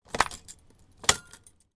van key put in ignition turn once mercedes sprinter; transportation; sound effects; sound effects one